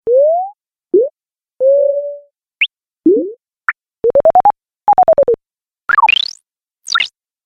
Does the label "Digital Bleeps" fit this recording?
Yes